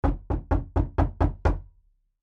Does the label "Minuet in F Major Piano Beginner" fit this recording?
No